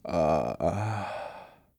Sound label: groan; Singles; French Version